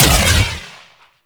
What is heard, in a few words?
Explosive Impact; Weapons; Sapient Primary Explosive Impact G; Warframe